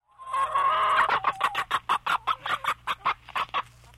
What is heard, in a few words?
chicken clucking